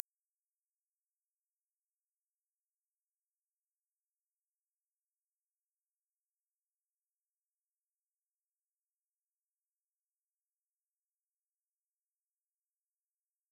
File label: 17200Hz Sinus